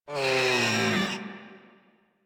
Kavat Vocal Death; Warframe; Khora

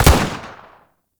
Shield Lancer Pistol Shot 3D C; Warframe; Lasrian; Lasrian Soldiers; Weapons

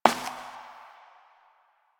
Step Perforated Metal; Warframe; Koumei

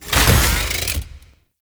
Necramech Ranged Vault; Enemies; Warframe

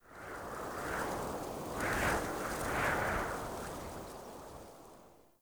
Mountain Wind Gust Whistle Soft

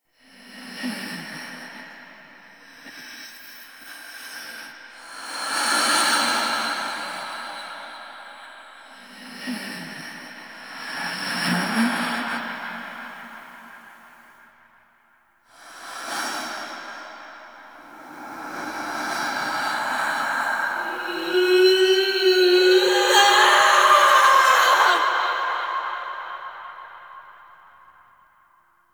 Vocals; Jade; Warframe; Jade Shadows; Quests; Transference Birth Cinematics

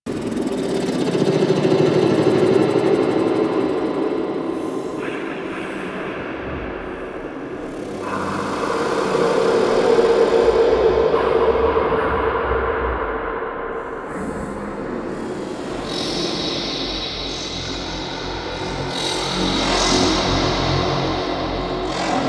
Exterior Amb, Ambience; Cephalon

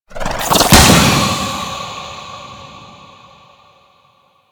Infested Collar Blast; Warframe; Infested Alad V

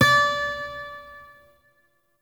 Shawzin Oct Three D; Warframe; Lotus Shawzin

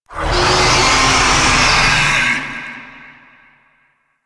Kraken Call; Warframe; Warframes; Pirate

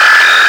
Screen Flicker; Warframe; Duviri; Easter Eggs

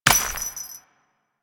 Step Dirt, footsteps; Warframe; Grineer Royal Jester; Foley

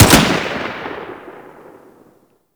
Railgun Shot; Warframe; Weapons; Sentinel; Sent Elec Railgun

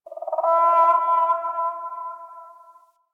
Venus Landscape Distant Birds; Ambience; Warframe; Venus Open World

